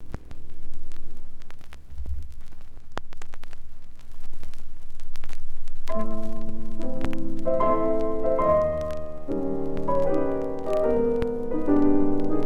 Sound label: Violin